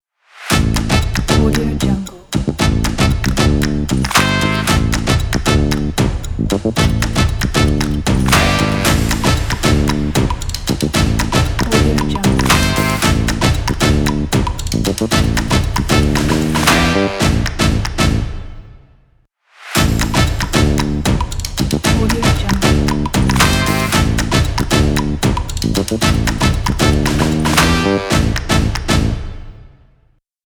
percussion bass logo

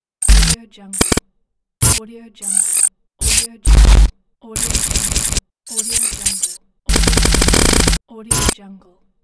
glitch fx; quattro-boom

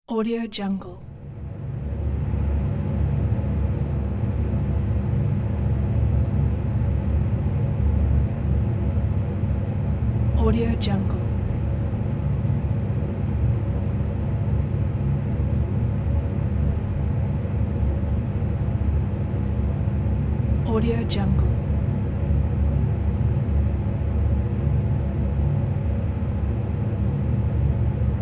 engine room

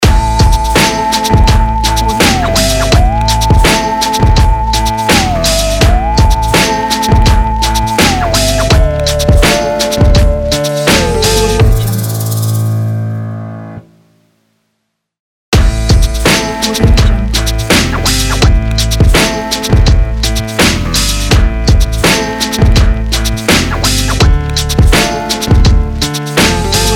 night lowrider logo

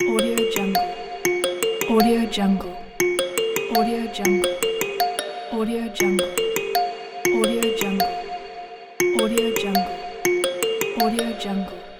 phone ringtone

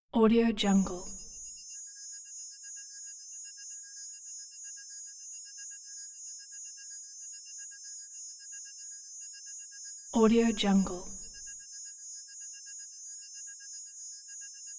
scifi device high tones; hollywoodedge

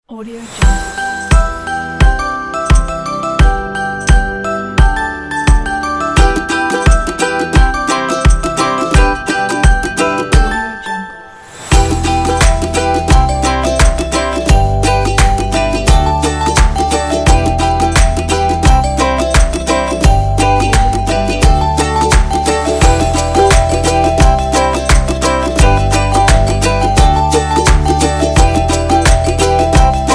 happy music

